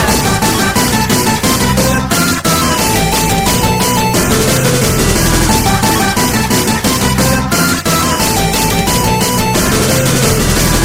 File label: beat drop